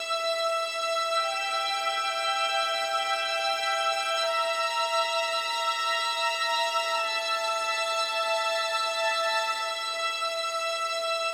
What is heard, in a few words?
sounds music; Halo Combat Evolved; Xbox